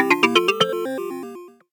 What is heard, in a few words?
Modular, notifications; Motorola; Moto G4 Plus